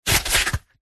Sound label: sport rugby ball spin on hand; zapsplat